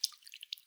くちゅ 中; くちゅっ 短め

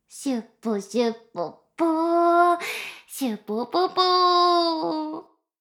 しこしこ音声, ASMR; 少尉; 牧場戦腺

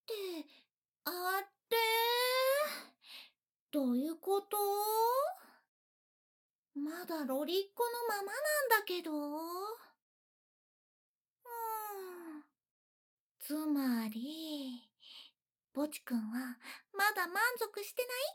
お耳をトロトロにするオイルマッサージ, ASMR

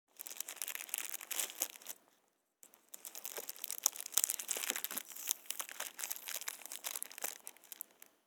花火の包装を剥がす; 夏実と縁側花火デート

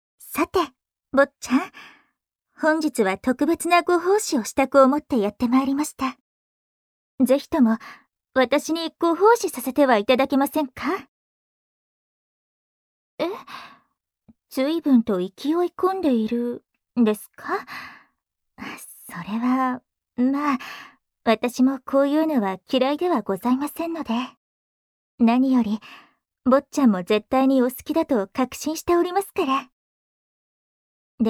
耳舐め右耳

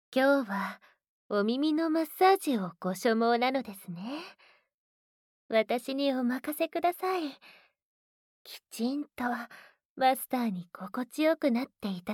左 耳炭酸しゅわしゅわ→オイルマッサージ→耳かき→梵天, ASMR

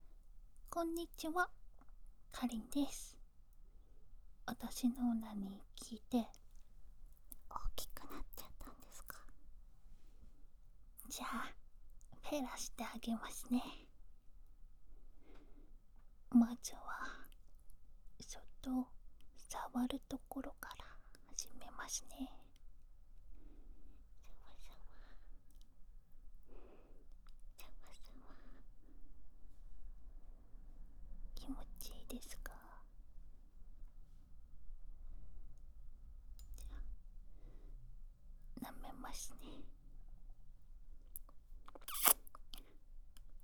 フェラ カウント ステレオ; 絶頂カウント有り

